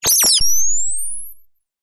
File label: science fiction night vision goggles or equipment switch on high pitched whine; zapsplat